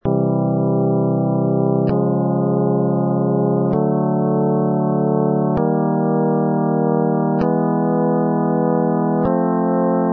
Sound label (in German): MittelDur, mitteltonige stimmung